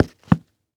Foley Footstep Soft Sole Work Boots Single Step On Cement